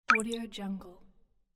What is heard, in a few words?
water drop